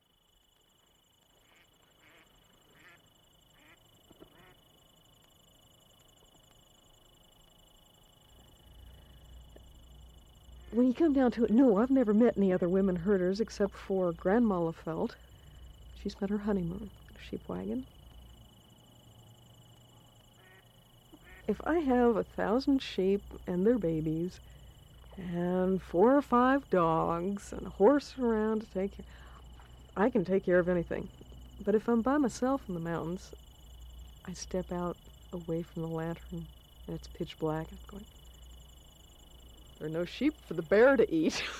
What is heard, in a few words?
Sheep Herd